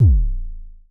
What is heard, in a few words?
Mild Thump Kicks A0, Vermona Kick Lancet; LookIMadeAThing Kicks; M8; Mild Thump Kicks Samples